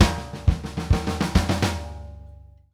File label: BUILD UP, SP FILLS 132; Sampleheads - New York City Drumworks VOL-1